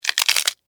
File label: Termite Bite, Animal, Termite, Bite, Hard, Crunch, Wood, Insect, Bug; Ants; BlastWave